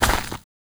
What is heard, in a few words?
walk gravel